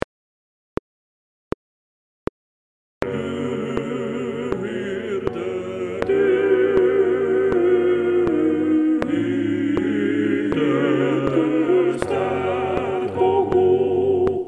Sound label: TUTTI piano CLICK